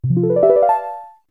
Press Start; GameCube System Sounds; GC

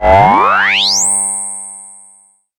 Charge; Chaser

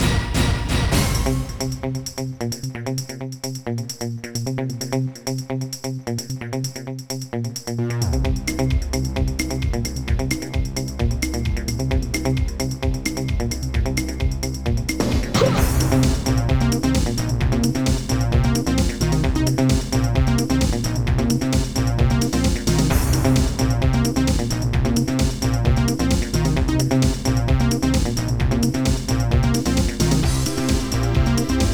Bonus Stage; Jazz 2

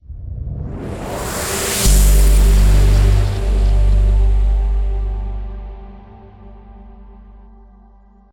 Transition; Everspace 2

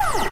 Bot Roll End

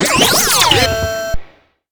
Take Damage; Raypunk

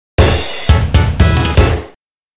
Jingle Jingle; Dragon Ball Z Super Battle 1 & 2; arcade

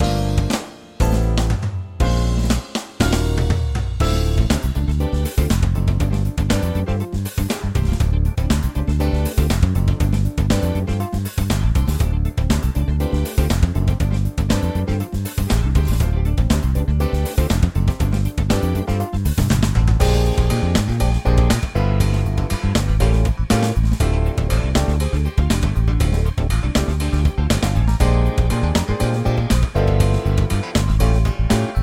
Drummin No Perc; EyeToy Play 2

